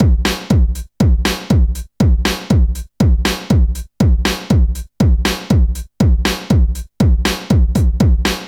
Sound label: HOUSE; INIS; Funky Xtreams